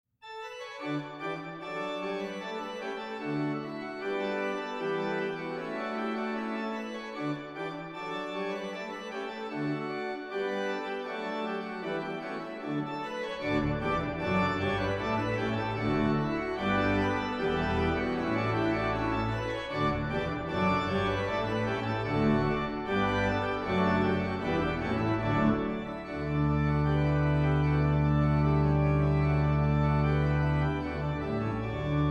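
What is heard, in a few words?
hornpipe, organ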